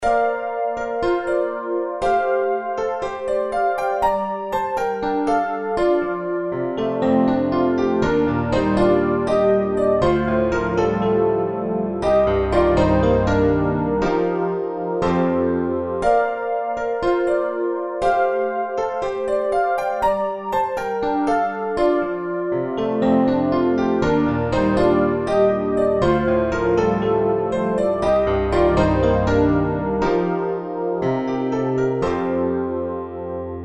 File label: Layer Piano